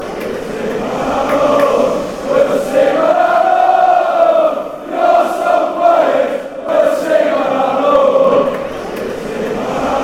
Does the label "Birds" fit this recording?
No